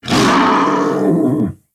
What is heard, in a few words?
shout; jushounuhou